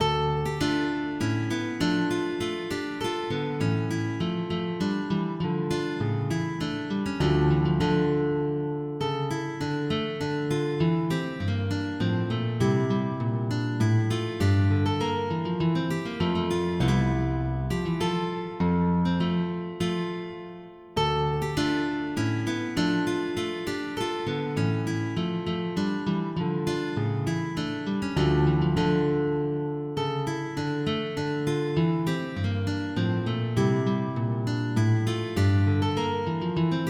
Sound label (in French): Le Canon duet